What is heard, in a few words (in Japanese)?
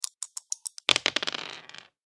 ダイス 振ってから 投げる